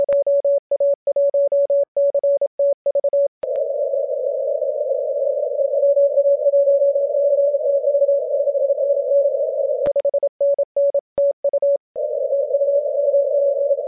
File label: 7 MHz CW